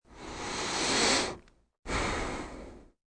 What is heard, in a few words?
Breathing Slow, Breathing, male, inhale, exhale, slow; human voice